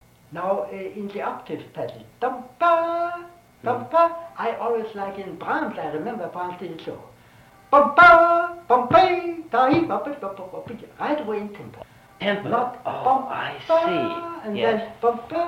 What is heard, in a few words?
Octaves; played by Brahms